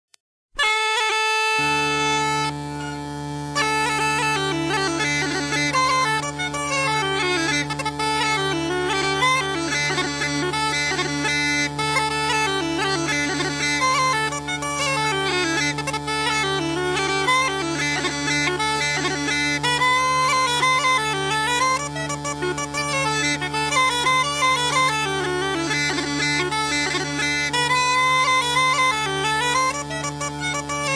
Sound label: solo jig